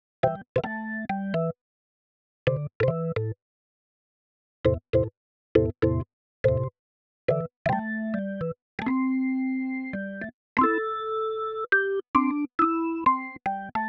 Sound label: reggae organ loop